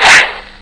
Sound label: whoosh; TJ Metronome